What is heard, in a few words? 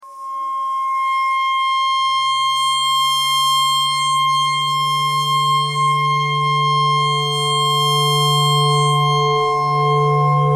clarinet clarinet notes e noise